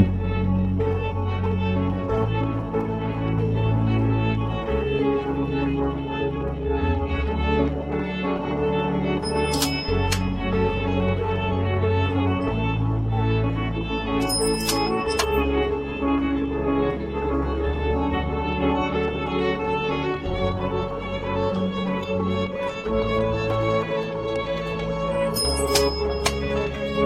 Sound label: no vocals